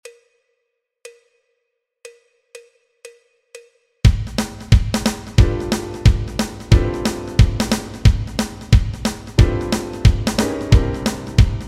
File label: Backing Track, walking bass